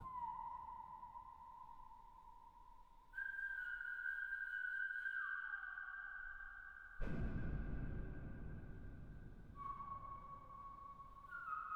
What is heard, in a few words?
bird